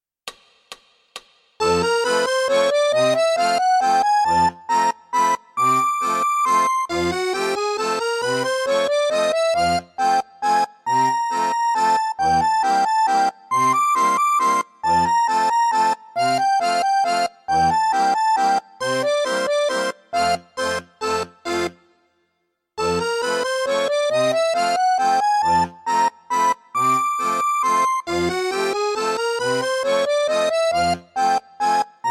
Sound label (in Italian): Cromatica, valzer, Fisa; Czerny